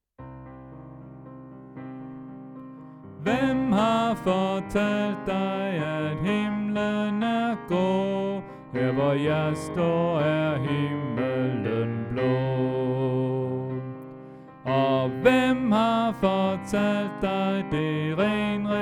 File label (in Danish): Tenor; oevefiler